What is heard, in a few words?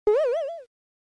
Arcade Action Jump